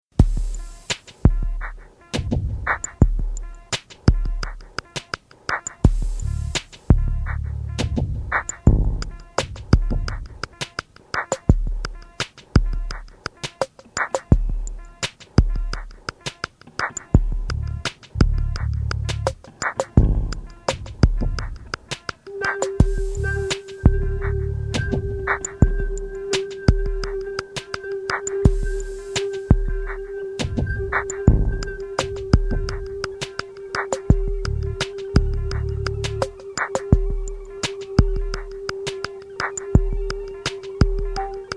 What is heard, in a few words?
Violin